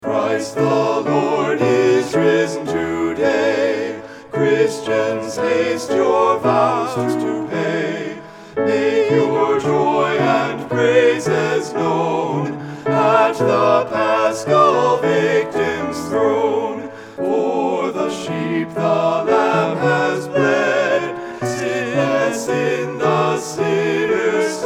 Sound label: Christ the Lord Is Risen Today SATB